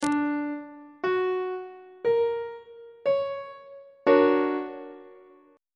c double sharp augmented major 7th chord on treble clef